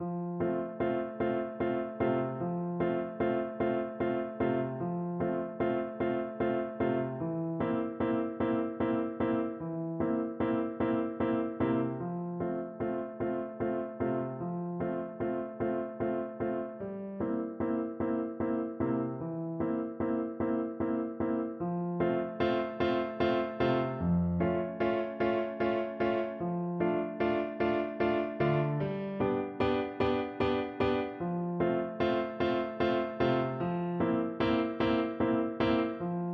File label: traviata overture, Trumpet; verdi